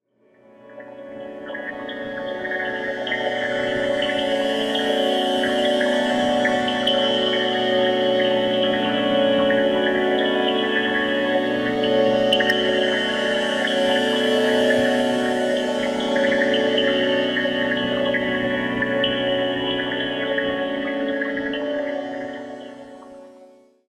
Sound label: Pad Cavepools B; exciplex sample presets pack; JinoBeats